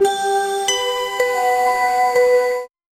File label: nostalgic lose sound, video games